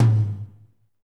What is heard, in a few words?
TOM, Jazz; Northstar - Drumscapes Roland